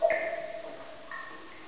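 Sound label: ambient cave; abuse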